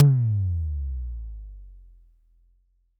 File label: TD-20 Tom A, Roland TD-20 Toms